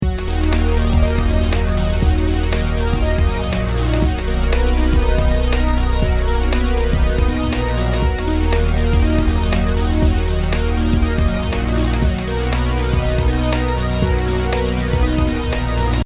bass clarinet

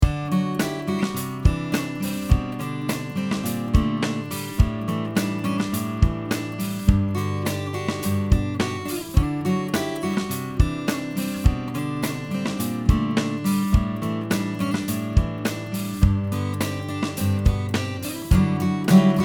ALG C414XLS

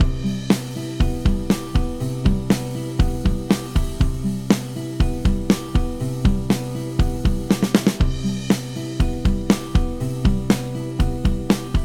WAVES comp ratio2

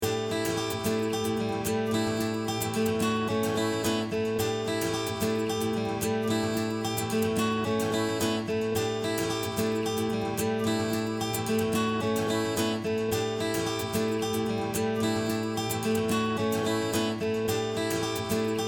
GUITAR

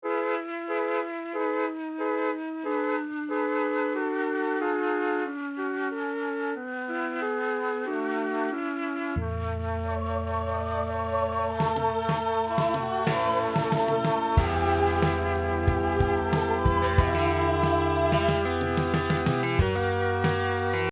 melotron flute; logic

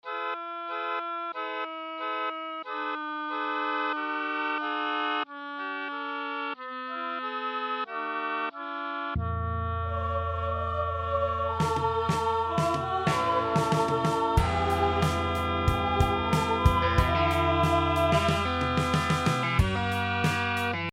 melotron micro oboe

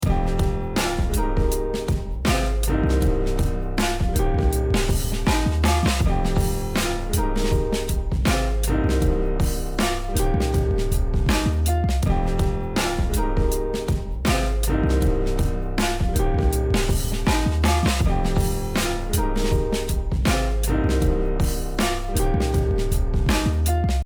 uad pultec

